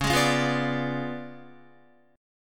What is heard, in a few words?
Db 7th Flat 9th, Guitar; strummed; JGuitar